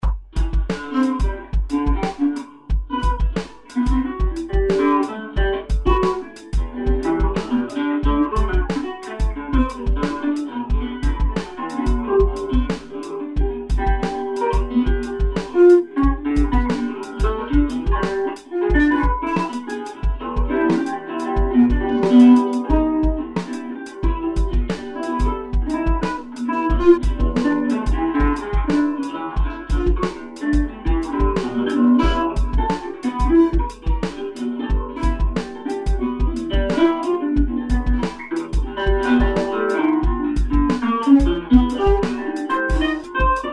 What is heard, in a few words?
impro, guitar